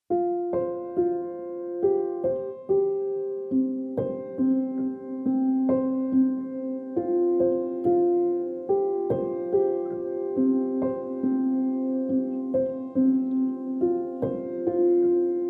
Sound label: Piano